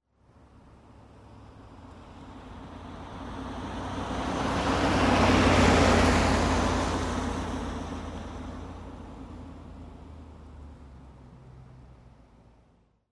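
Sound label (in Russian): проезд Range Rover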